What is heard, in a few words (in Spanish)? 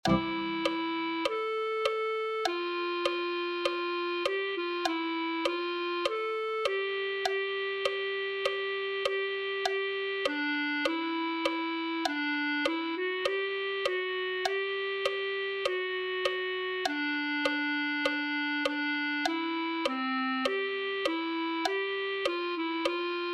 clarinete